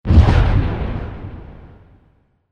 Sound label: Explosion